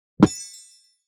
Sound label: staff out, weapon